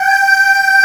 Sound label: B-3 P-CHRS